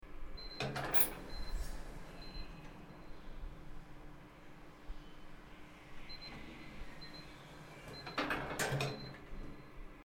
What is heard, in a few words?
Elevator Door Opening And Closing